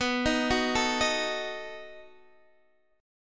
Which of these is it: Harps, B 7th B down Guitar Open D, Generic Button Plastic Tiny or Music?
B 7th B down Guitar Open D